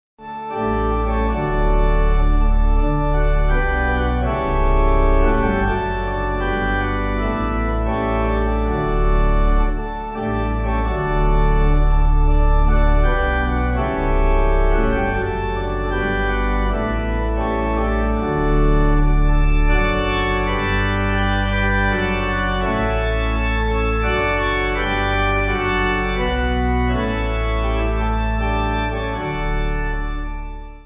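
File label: Pipe, hymn backing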